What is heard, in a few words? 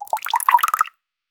modern ui riser ascending, ascending pitch